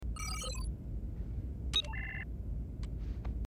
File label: computer screen off button push